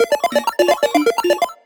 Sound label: win; Amatic; Lucky Little Devil